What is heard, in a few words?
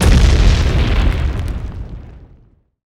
Nuclear Damage; bullet